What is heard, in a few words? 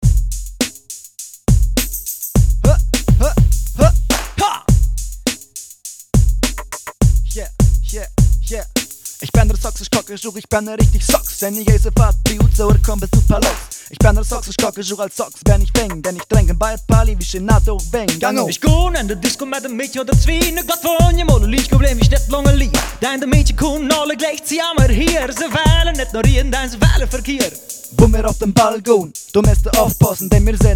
rap